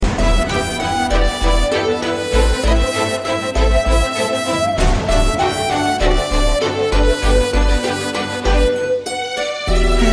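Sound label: background music ringtone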